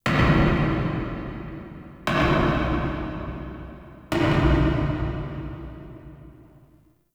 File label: Knocks Small; Warframe; Ambience; Entrati